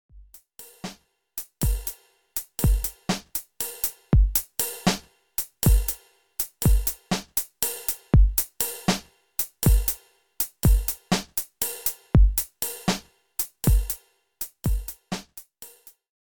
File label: drum